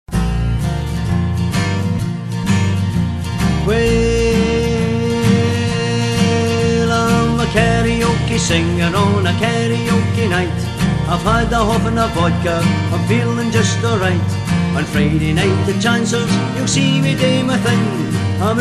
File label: The Karaoke Singer